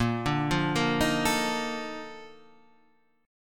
A sharp Major 11th A sharp

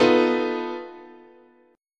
B 7th Flat 5th, chord

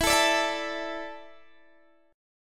E 7th Suspended 4th, Guitar, Standard; strummed; capo 9

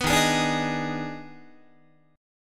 D sharp Major 7th Suspended 4th Sharp 5th, Guitar; strummed; JGuitar

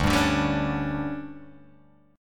D Major 7th Suspended 4th, Guitar, Open G

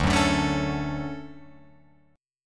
D Major 7th, Guitar, Open F; strummed; JGuitar

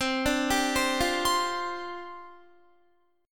C Suspended 2nd Suspended 4th, down, Guitar

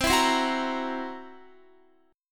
C 7th Flat 5th C down Guitar; C7b5 strummed

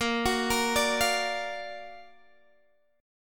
Gb Augmented Major 7th Bb

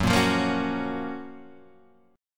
F sharp Major 7th

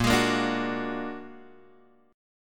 Bb Augmented 7th Bb down Guitar Open G

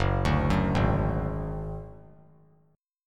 Gb Suspended 2nd Sharp 5th 3C,3F,3A,3D; Gbsus2#5 arpeggiated; 6,9,9,6

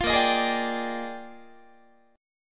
Bb 13th Bb Guitar, chord; strummed

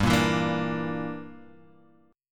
G Minor 6th, Guitar, Half Step Down

